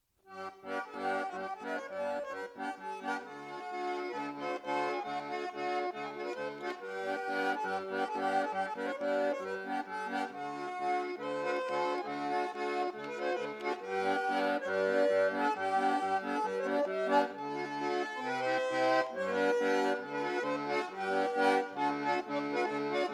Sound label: polka, dances